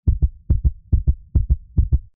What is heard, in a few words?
anticipation heartbeat; Fluffy Favourites Megaways